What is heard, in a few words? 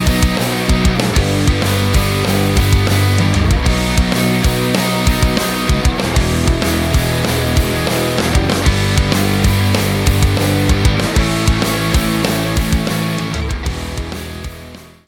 ROCK